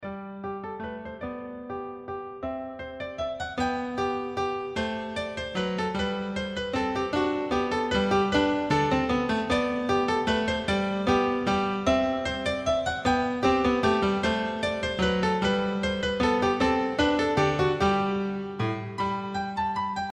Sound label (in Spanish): Minuet sol, Piano; Clasicos